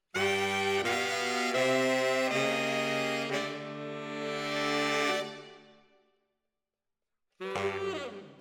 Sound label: ORTF SCHOEPS, piano